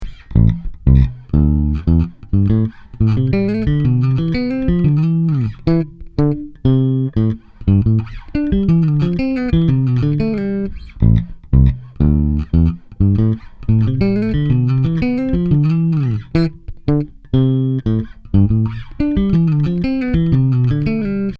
staccato; tc electronic

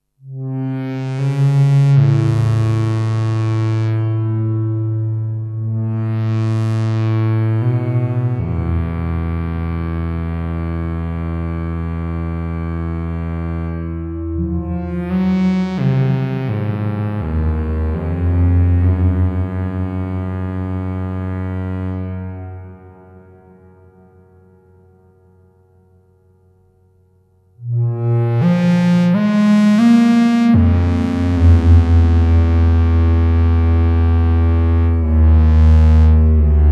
resonant reverb

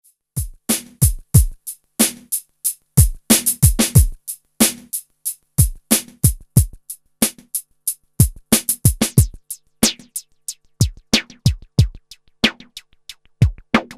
Drums

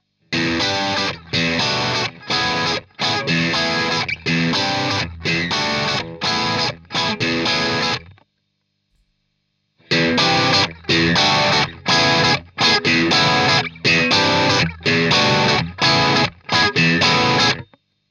Screamer CH1 complex chords high gain; JHS

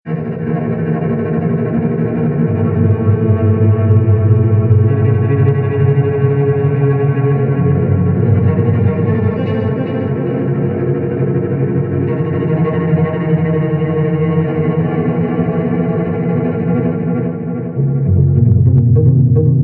T2 cello industrial

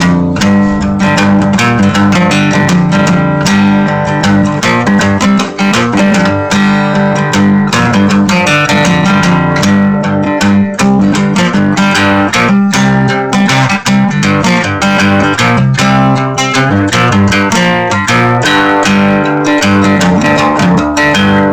El Moo Max saturation

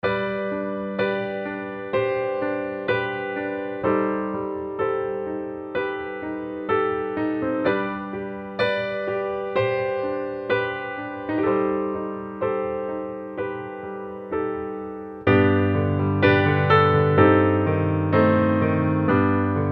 Studio Grand Ballads Standard; EZkeys

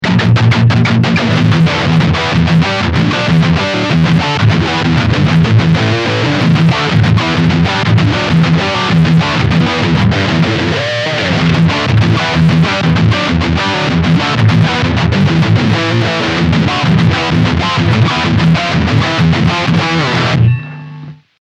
Lead

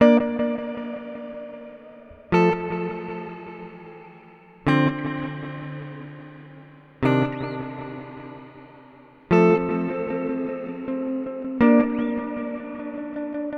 Amplitube X-Time Rev Pong

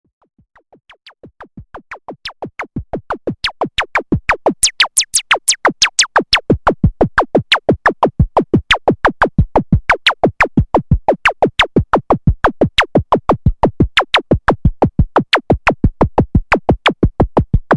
dry RESO

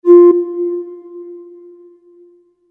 K5k Resynth Noise

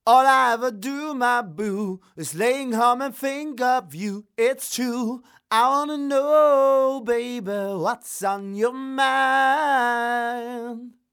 low cut

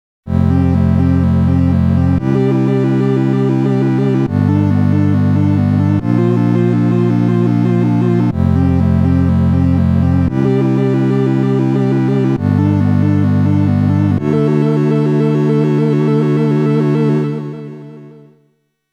Modal Craft Synth 2.0

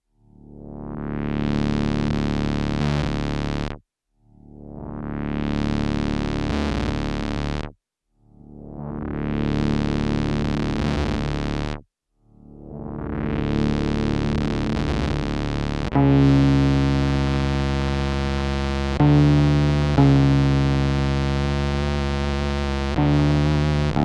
Synth Square Long Attack

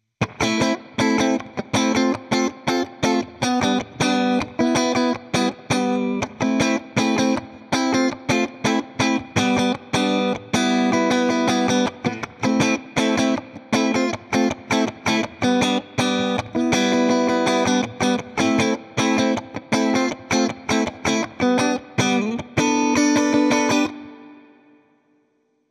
Amplitube4 Twin Reverb Rhythm Clean; irig Micro Amp

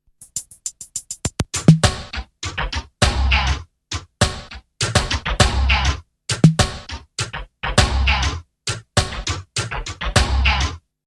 Processed Loop; Aphex

